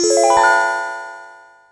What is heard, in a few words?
twisted bell tree